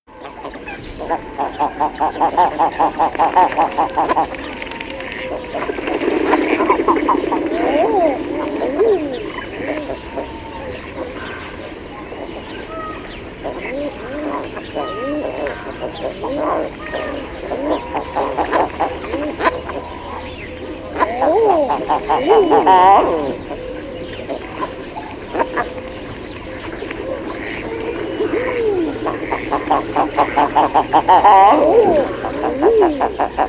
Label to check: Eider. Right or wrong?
Right